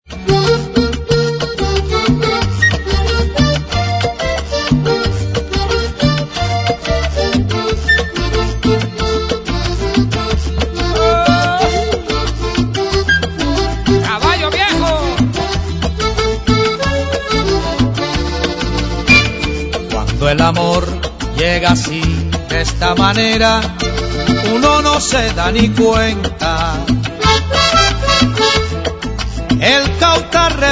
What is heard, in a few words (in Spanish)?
cumbia; Baile popular